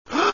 gasp

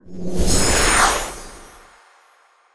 sfx teleport; Earth and Beyond; EA Games

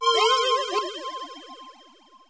activate ability; shortcut; Earth and Beyond; EA Games

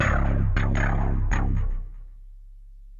AMBNT 160; Ambient

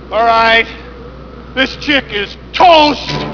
ghost

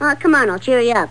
illcheer

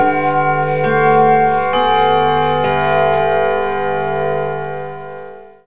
CLOCK CHM; REDNECK; RAMPAGER

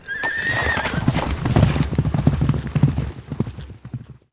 horse move

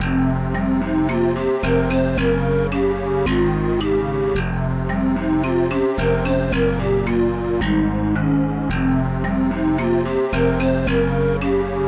tune; EuroCD 3

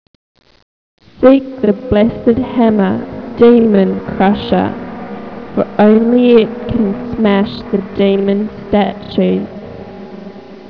mouth

